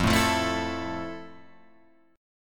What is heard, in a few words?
F Major 7th, Guitar, Open F; strummed; JGuitar